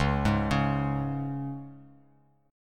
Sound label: Db 5th Db down; Db5 arpeggiated; JGuitar